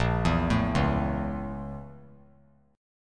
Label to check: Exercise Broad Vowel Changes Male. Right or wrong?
Wrong